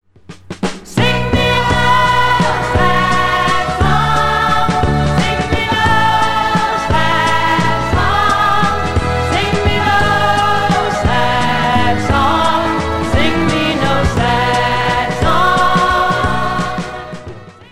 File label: song